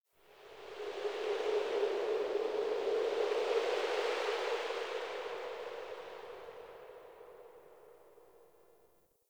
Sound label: dark wind; Warframe; Tau